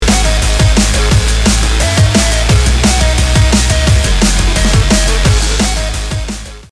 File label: surf